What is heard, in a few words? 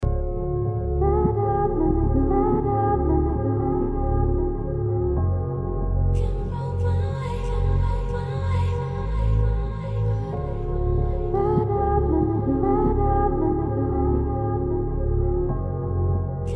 BPM 93